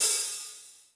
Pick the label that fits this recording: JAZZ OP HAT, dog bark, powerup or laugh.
JAZZ OP HAT